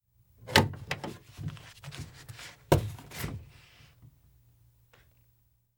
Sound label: Car Door Open; Fairlight Sound Lib